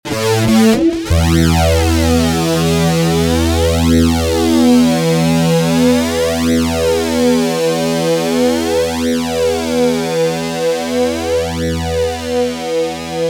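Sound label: fuzz guitar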